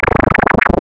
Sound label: creature